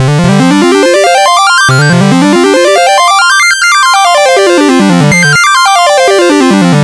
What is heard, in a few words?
C64 Synth, 8-Bit chiptune